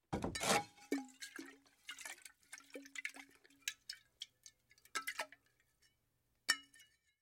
Glass Water Jug; Pick Up Glass Water Jug With Sloshes. - Water Bottle